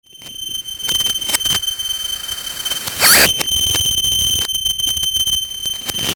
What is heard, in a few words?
Feedback Attack Static Distortion Glitch, Production Element Feedback Whoosh Right To Left; Feedback Sound Effects